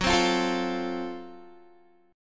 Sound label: Gb Minor Major 7th Flat 5th, Guitar, chord